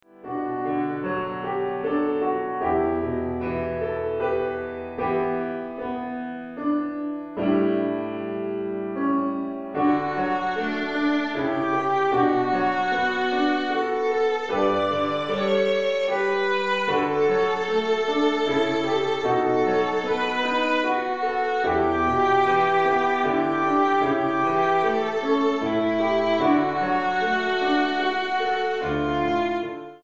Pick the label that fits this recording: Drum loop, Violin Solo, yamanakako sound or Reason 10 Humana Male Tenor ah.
Violin Solo